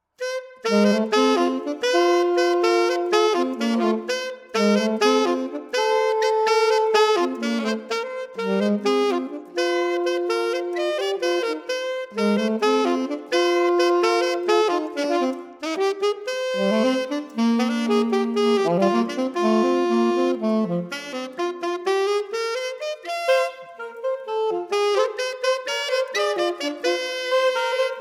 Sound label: alto sax; GCSE Music Showcase